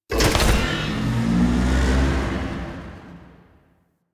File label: UI Mod Activate Super Weapon; Player Ship; Warframe; Railjack